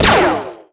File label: lazer shot; Birthday Party Bash; Wii; Spanish; laser gremlin